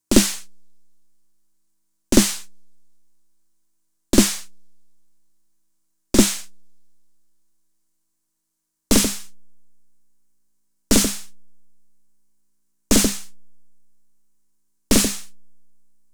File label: RD-9 vs TR-909 FLAM